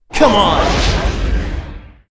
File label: activated rapid fire; BTDB2